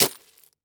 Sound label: Impact Debris Glass Debris Hit By Plastic Pipe